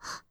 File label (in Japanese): ブレス ショート